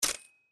Place chip in bet square; Blackjack

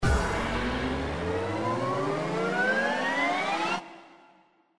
SFX Anticipation; slots; Panda Majesty2

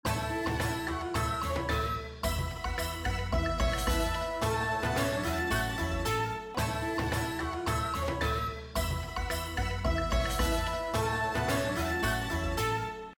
BGM Match For Cash; slots; Panda Majesty2

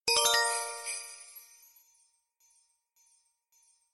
Win Regular Symbol, SLOTS; Christmas Blast

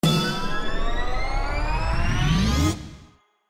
anticipation bonus; Zodiac Millionaire

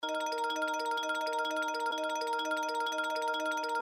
Spin Sound; slots; House Of Luck

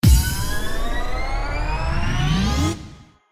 anticipation jackpot; slots; Zodiac Millionaire

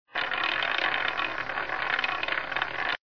loud Spin Loopable, Roulette

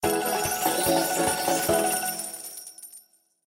big win; House Of Luck

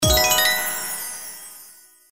Win Higher Symbol; slots; Valentine Blast

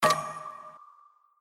Reel Spin Stop; slots; Sharks Vs Dolphins

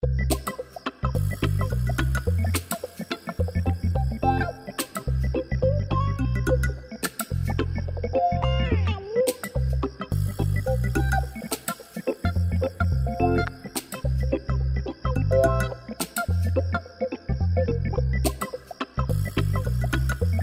BGM FREE SPIN; slots; Jamaican Jackpot

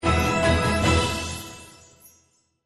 Win Symbol WILD; Christmas Blast

